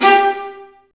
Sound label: String Sections, Violas Marcato; Roland LCDP13